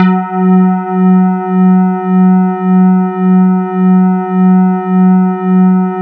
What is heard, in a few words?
E-HARP, DX HARP; Wizoo - Powered DX